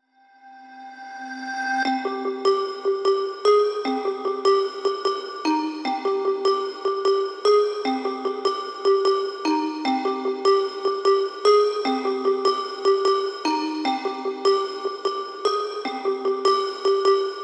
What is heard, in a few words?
Synths 150